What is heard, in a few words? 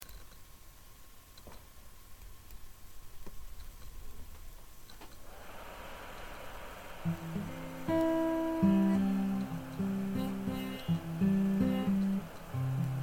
guitar play